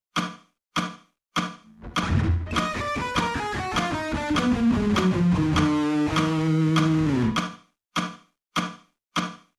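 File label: economy picking slow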